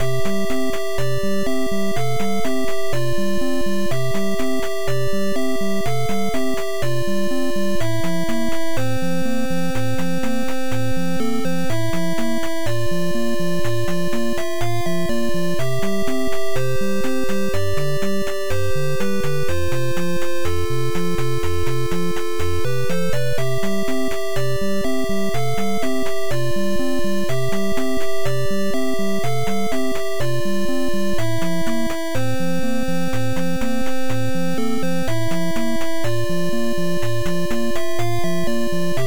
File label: Victory (without Fanfare); nes; Advanced Dungeons & Dragons Pool of Radiance